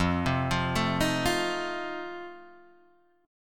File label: F 7th F down Guitar Standard; F7 arpeggiated